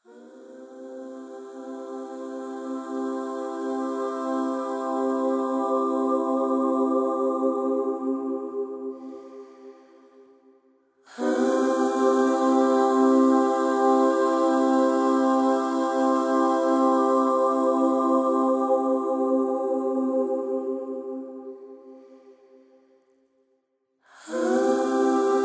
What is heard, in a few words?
Interval toning C E G